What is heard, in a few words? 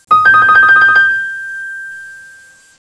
Melodic Ring